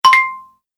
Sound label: Message Notification; High Tech